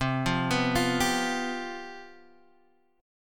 C Major 7th Suspended 4th, down, Guitar, Half Step Down